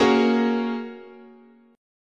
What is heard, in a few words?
A sharp Minor 7th Sharp 5th, guitar chord